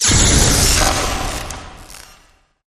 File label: Electro Zap